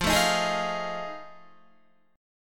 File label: F 7th Sharp 9th, Guitar; F7#9 strummed